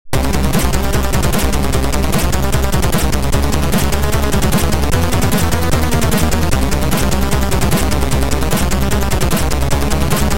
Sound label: Bossfight music; Metal Warrior 3; Mercyless March; Metal Warrior Trilogy